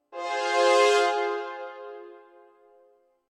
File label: Sting Death